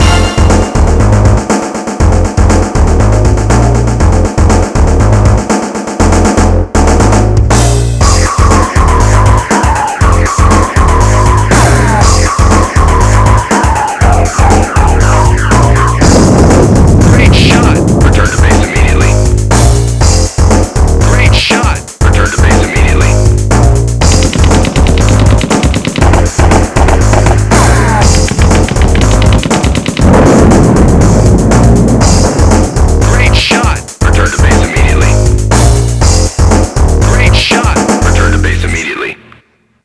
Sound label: win; svencoop; cnc